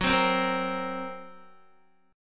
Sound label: F sharp Major Flat 5th F sharp Guitar; F#Mb5 strummed; 13,15,12,15,12,x